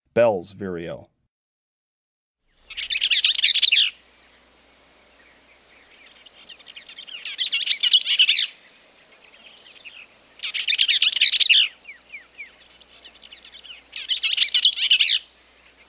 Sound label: Bell's Vireo